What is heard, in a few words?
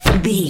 bullet impact wood heavy